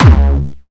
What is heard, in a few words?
Jump kick, Jump hardstyle kick